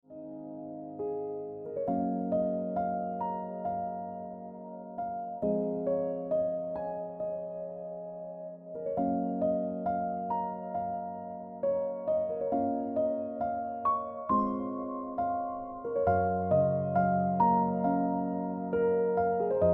Ringtone Piano Music

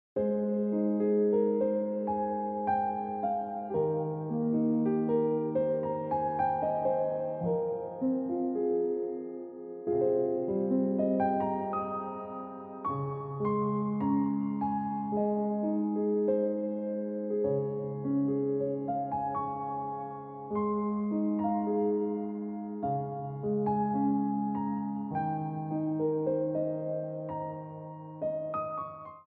Deep In Mind Ringtone Piano Music

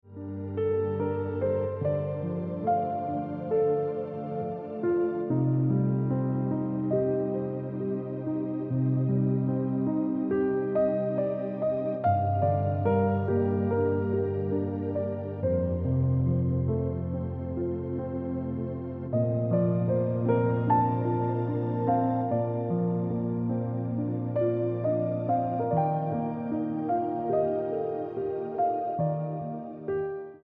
Piano Music

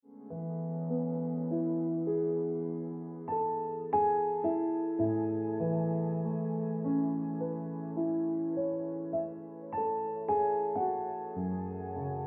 Ringtone Piano Music

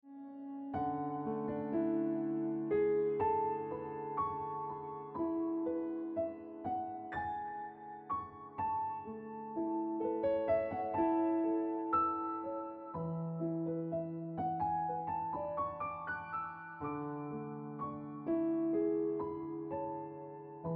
Piano Music

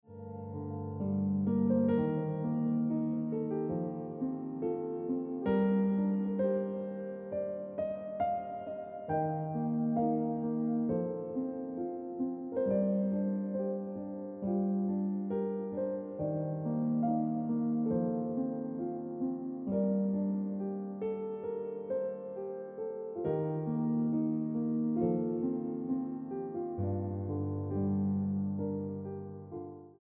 Piano Music

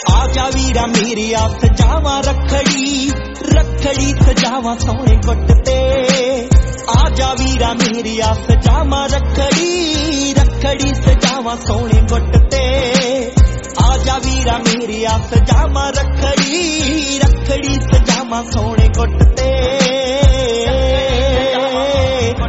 Panjabi Ringtone

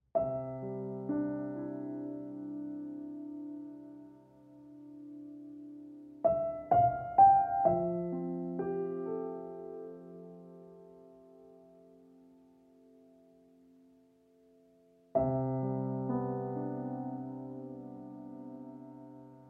Dancing Trees Ringtone Piano Music

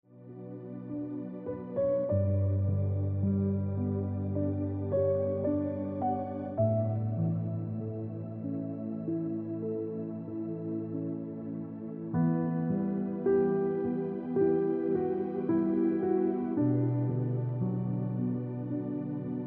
Look Back On Ringtone Piano Music

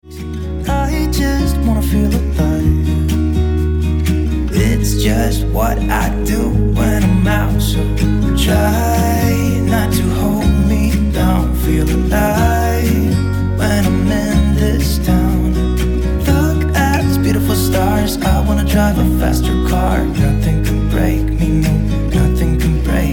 Acoustic Version

